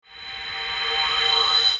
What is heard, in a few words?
miracle pre